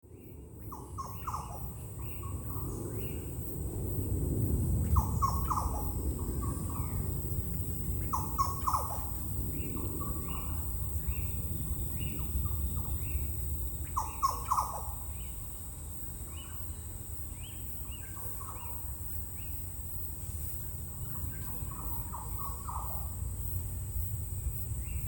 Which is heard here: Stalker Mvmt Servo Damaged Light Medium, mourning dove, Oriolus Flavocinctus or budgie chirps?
Oriolus Flavocinctus